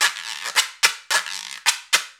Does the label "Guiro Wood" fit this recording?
Yes